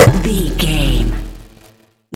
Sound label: sci-fi spark electric device hum